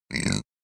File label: Man belching closed mouth